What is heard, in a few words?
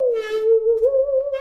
saw danger, music, zombat